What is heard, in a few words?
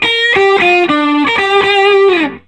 SOLO G, Vintage Blues Guitar; USB Soundscan vol.22; AKAI